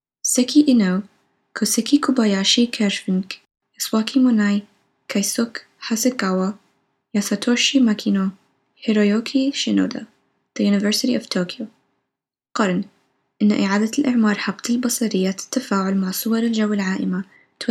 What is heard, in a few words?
horn; arabic